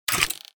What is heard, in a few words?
close